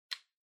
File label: Light Switch-American Toggle (Dry-Gentle)